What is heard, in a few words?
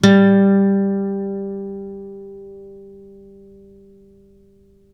ACUSTC G 2, ACOUSTIC guitar; East West; Steve Stevens Guitar Samples Collection; ACOUSTIC PCK